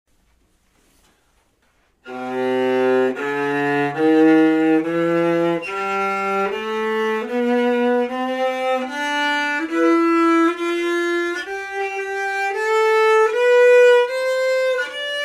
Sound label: Plywood viola scale; viola-acoustics